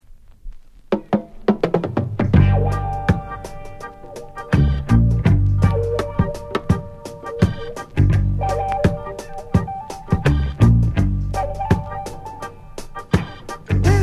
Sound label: reggae music